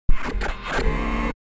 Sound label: Glitch accent broken robot